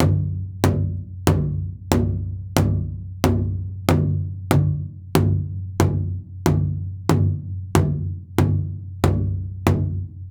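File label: Big drum mallets; Nightfall (Tabbyewik)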